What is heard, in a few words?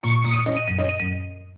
Low Battery